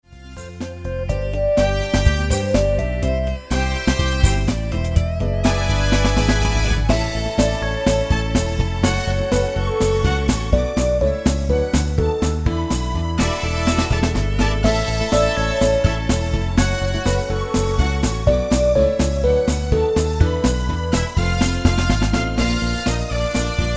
Hypersonic

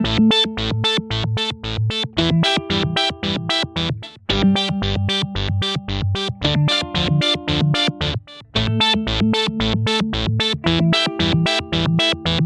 Boss SY 1 SEQ

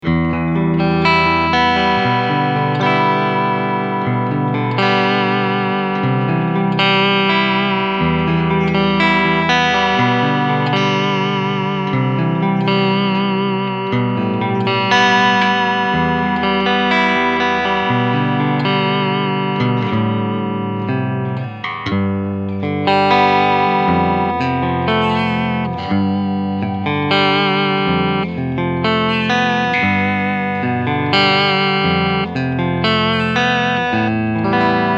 CL Treb Full Bass Low

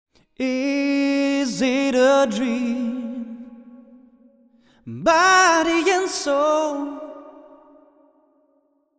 North Church

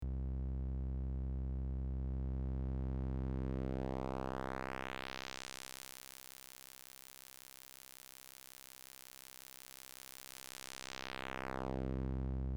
Band Pass

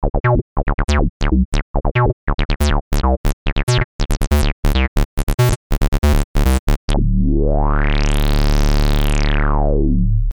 Square

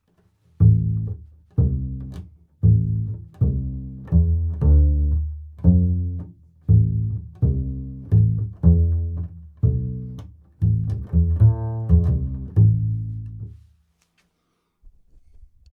Upright Bass Low cut; avantone ck7

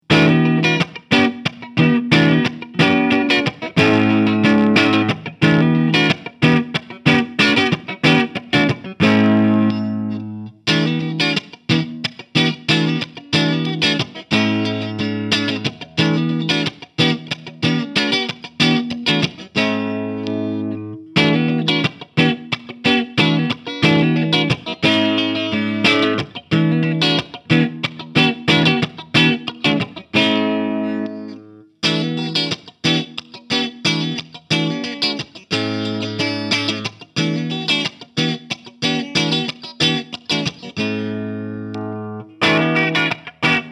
Harley Benton R 446 Clean; Blue Metallic

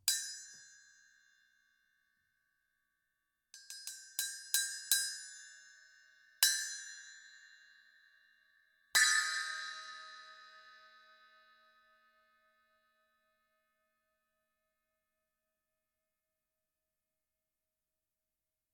Bell

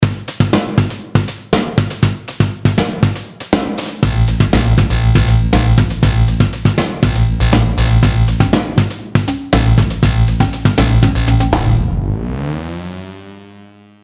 DrumComputer; MC Oddball

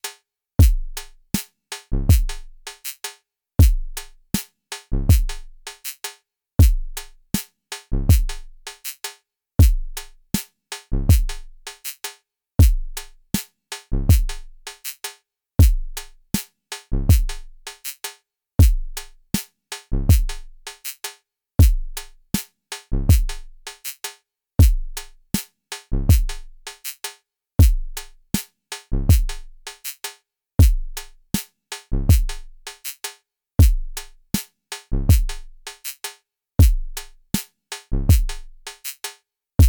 Delay Drums Original; BIAS Pedal

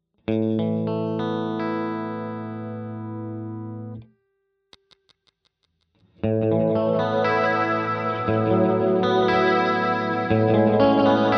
LFO; SPACE BENDER x10

